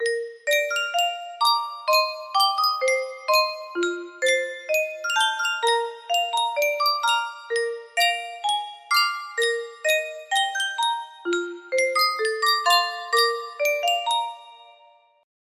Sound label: Sankyo Custom Tune Music Box, music box melody